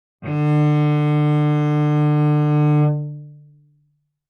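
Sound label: Cello arco fortissimo sul G D4; MIS Pitches - 2014; Strings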